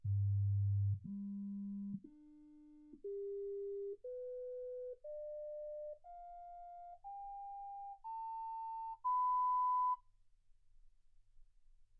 sinus tones 48kHz

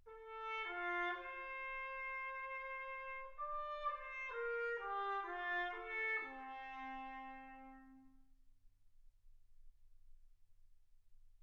trumpet

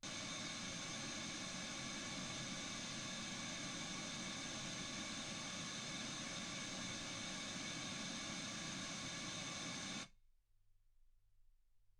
wgn

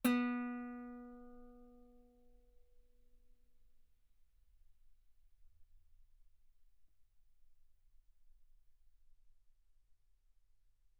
Guitar B3; ff; sul B

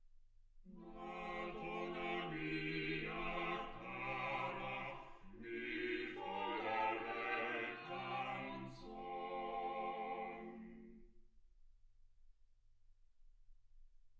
quartet

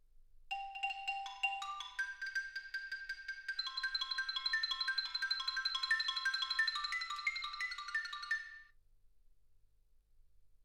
xylophone

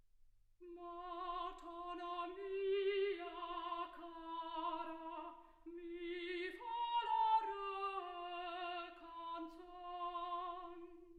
soprano

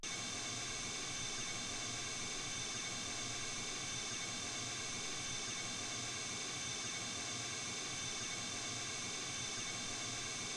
mls16 48kHz

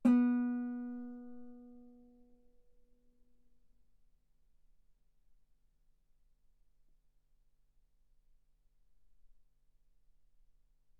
Guitar ff sul B B3

